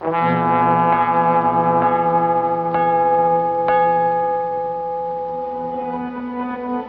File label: horns bells, Loops